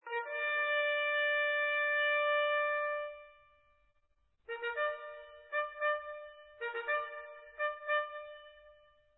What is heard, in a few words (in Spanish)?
parte, corneta